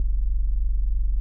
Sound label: Sylenth1